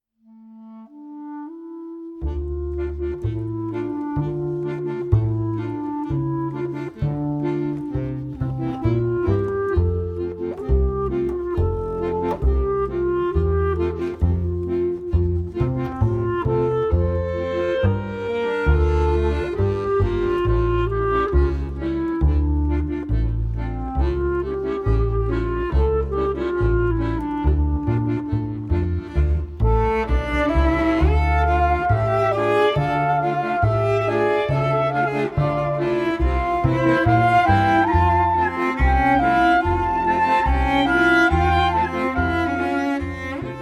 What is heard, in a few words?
klezmer standard